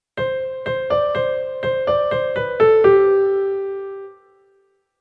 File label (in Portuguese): polifonia